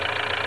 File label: diesel; phasensteller